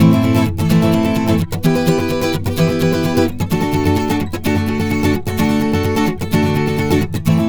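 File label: Acoustic Latin Guitar 128BPM A minor; Acoustic Latin Guitars; Vengeance; Essential Clubsounds Vol.4; Guitarloops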